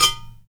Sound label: UDU, African